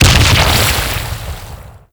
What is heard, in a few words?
Pirate Cannon Explode; Warframe